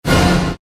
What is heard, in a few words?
crash sound; wowowin